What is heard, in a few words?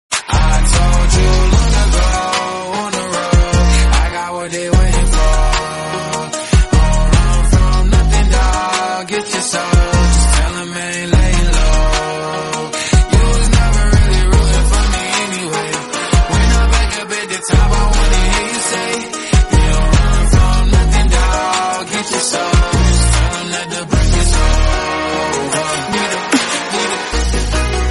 marimba